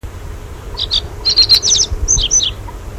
Emberiza schoeniclus (song)

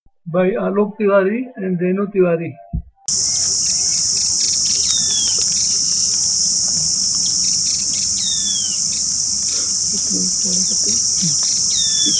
Yellow-eyed babbler call

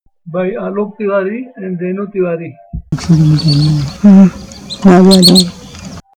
Grey headed Lapwing; Alok

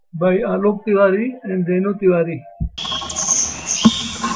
White tailed Nuthatch audio; alok

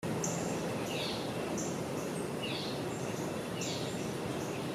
morning side Park

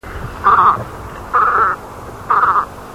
Melanitta fusca song

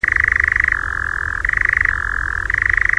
Caprimulgus europaeus song

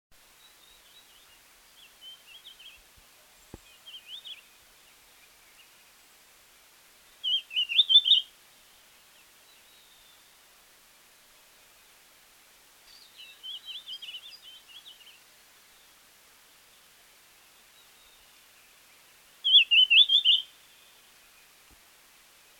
Dusky Fulvetta

